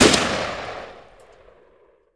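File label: ak 47 exterior shot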